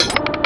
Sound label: grapple fire; quake2; railz; weapons